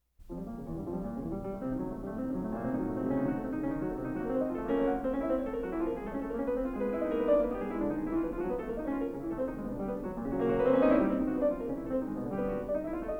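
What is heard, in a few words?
Piano Sonata in B flat minor, Presto; Op 35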